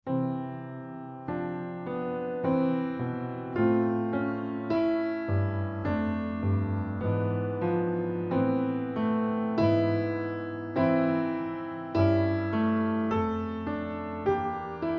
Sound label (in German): Übermäßig Dreiklang